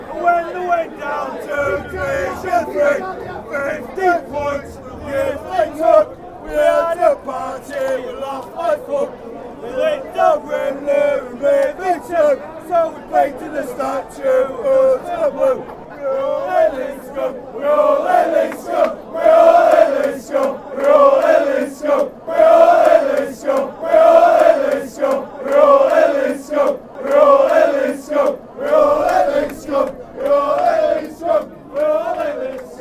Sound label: fans cry, fan chants; on tv; dirty leeds